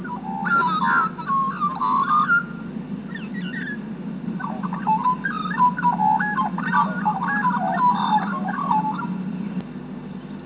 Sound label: magpie group